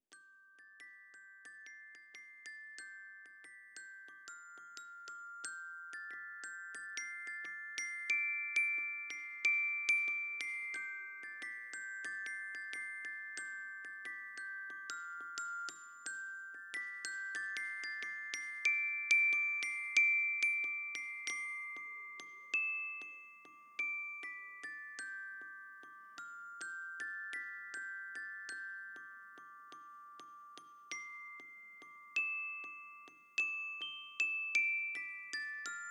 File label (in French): Musique